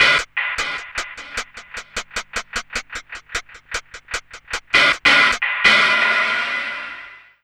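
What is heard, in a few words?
NAKD hi-hat, drum loop; Big Fish Audio; Uncivilised G'rooves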